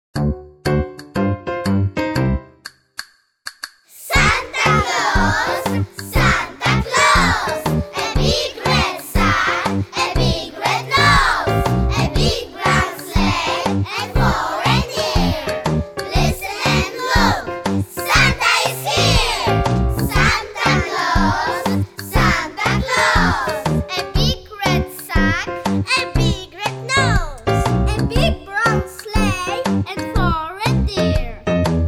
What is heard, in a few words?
SANTA CLAUS song